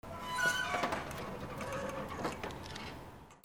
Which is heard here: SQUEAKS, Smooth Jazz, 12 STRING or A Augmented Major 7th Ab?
SQUEAKS